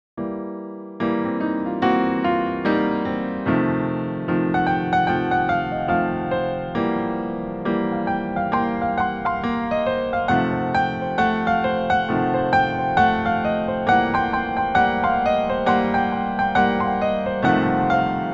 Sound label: jingle